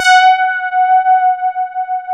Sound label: DS8 F#5, Korg; Back In Time Records; Korg Universe VOL-1